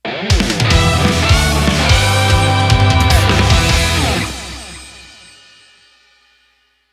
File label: VICTORY SHORT